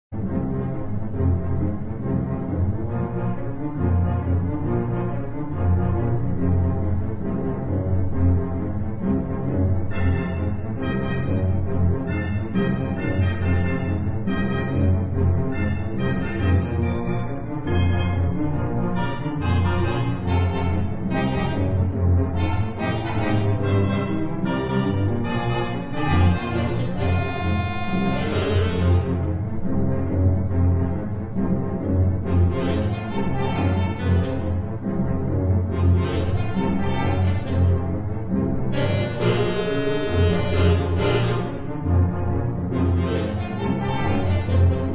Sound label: theatre organ